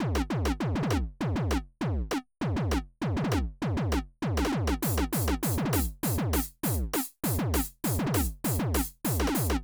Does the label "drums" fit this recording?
Yes